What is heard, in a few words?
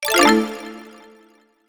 picture message